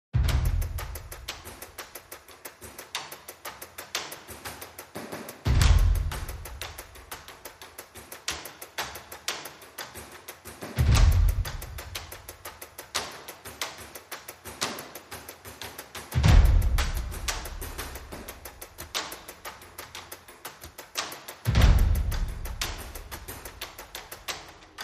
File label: Drums Only